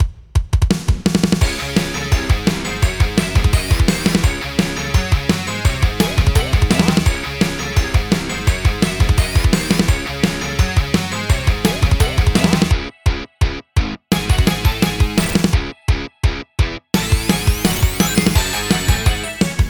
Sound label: Strings (Instrumental); Friday Night Funkin Slutty Hedgehogs Collection ost mod windows gamerip 2023-2024